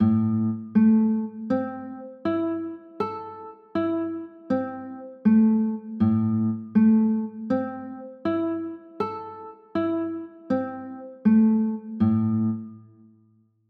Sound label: a m, chords